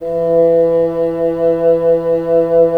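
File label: WND BN, wind bassoon; LA Composer vol. 3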